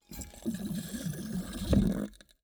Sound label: shock absorber, broken, decompress, oil, bubbling, scuffing, flatulent; Eiravaein Sound; Vaeyan III